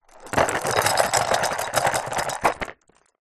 leisure magnetic building set in box no lid movement